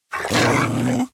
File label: Warbeast Bite